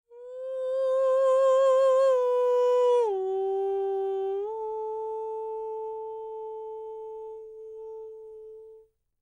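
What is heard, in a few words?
falsetto, Vocal Planet Jazz FX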